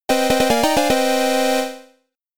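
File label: Round Clear Fanfare; Namco Sound Museum from X68000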